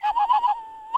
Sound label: WildFX, African; Quazibeat; African Secret; SFX